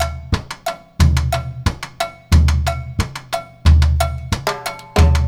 Perc, Percussion Latin

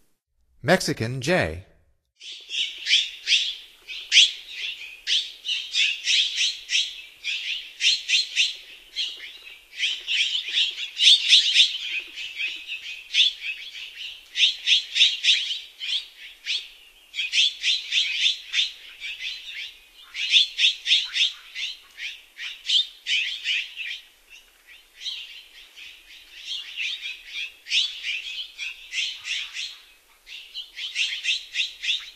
Mexican Jay, Songs of Birds of North America; Stokes

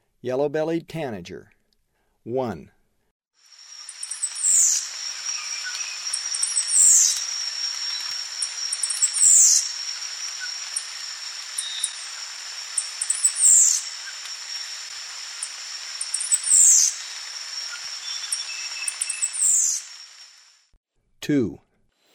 Yellow-bellied Tanager; S. America; Eastern Lowlands

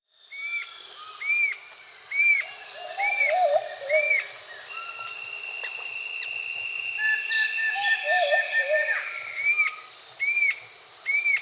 Sclater's Antwren (Myrmotherula sclateri), Peru; S. America

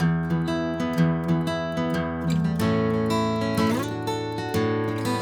Guitar EAD, Acoustic

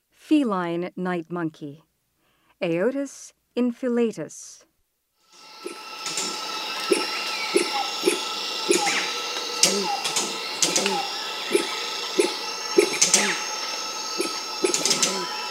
Feline night monkey; S. America; Rainforest

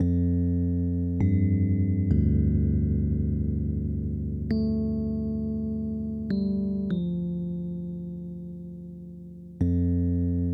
Electric Piano

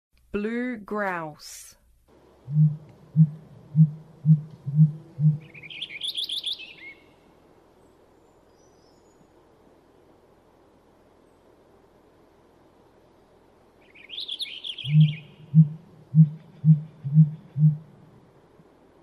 Blue Grouse, Songs of Birds of North America

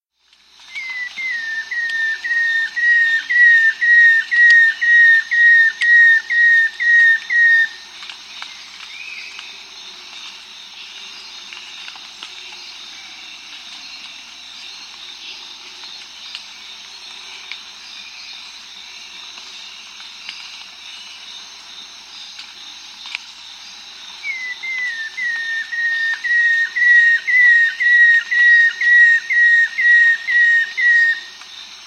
Black-Throated Antshrike; S. America

